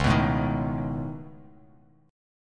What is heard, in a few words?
C sharp 7th Suspended 2nd Sharp 5th, C sharp, down, Bass Standard; C#7sus2#5; strummed; JGuitar